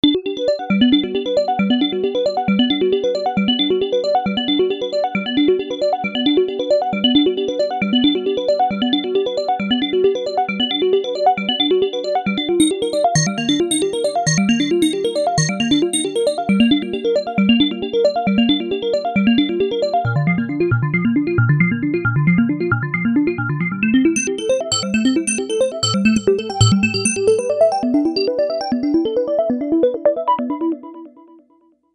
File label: Hammerfall, Novation UltraNova; Factory Patches